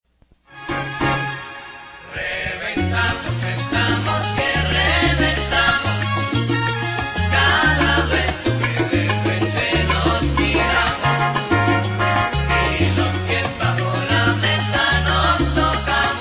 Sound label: salsa